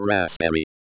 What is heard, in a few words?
raspberry